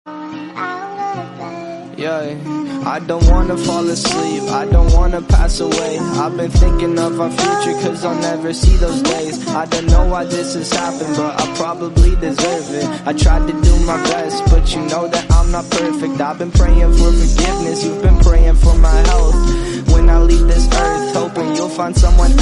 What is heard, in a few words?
REMIX SONG RINGTONE